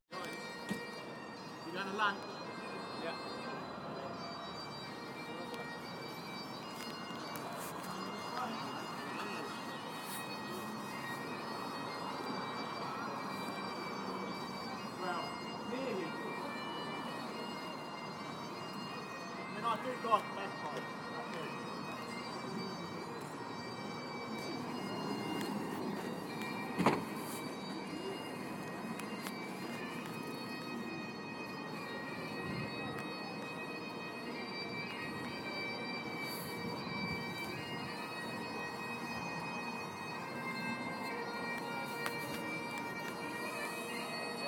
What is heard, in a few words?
piper at holyrood house